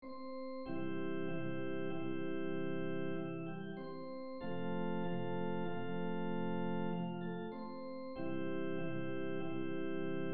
menuet majeur; mozart leopold

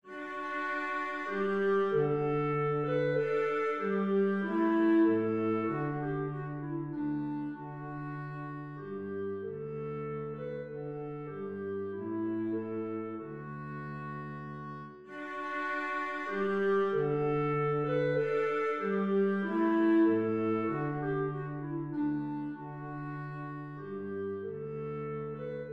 menuet majeur; lully jean baptiste

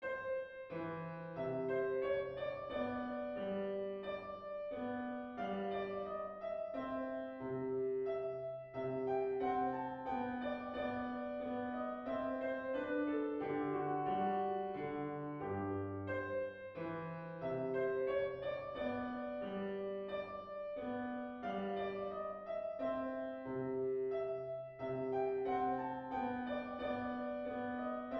menuet majeur; mozart wolfgang amadeus

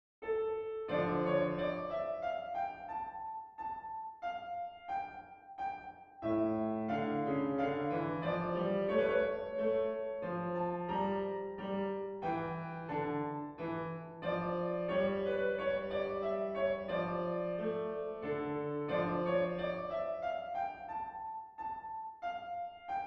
menuet mineur; lully jean baptiste

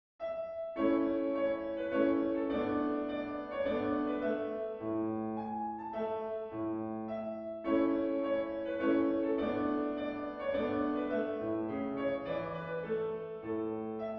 danse allemande la majeur; haydn joseph

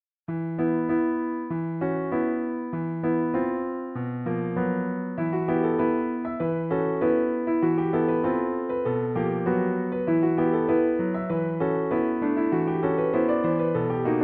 polka; berger

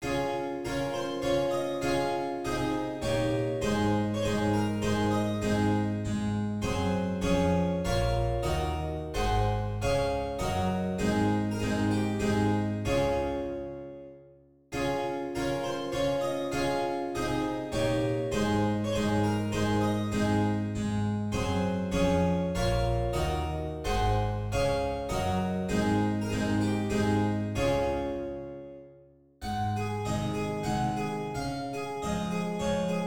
valse ra majeur; beethoven ludwig van

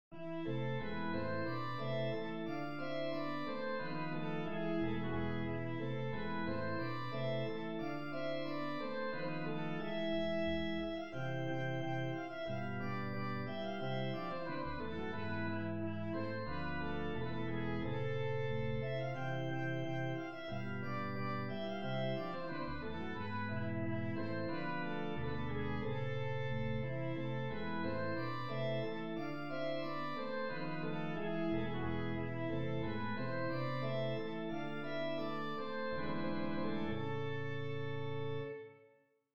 rigaudon mineur

